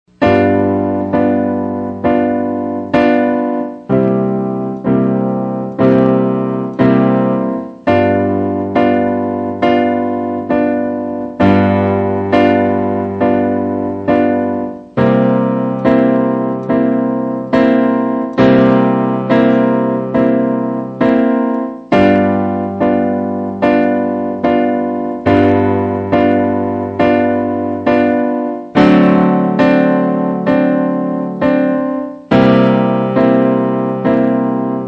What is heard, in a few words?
12 bar quick change